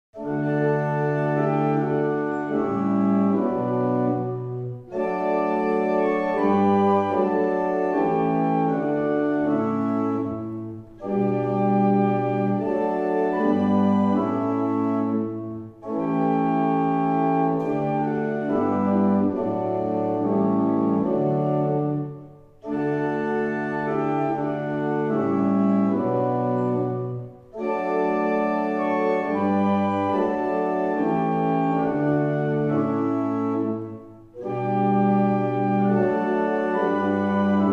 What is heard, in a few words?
Double chant, barrel organ; Stratfield; Mornington